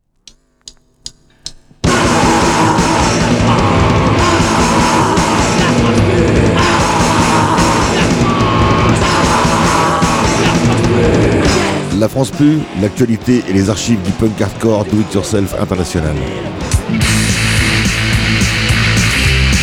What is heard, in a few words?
jingle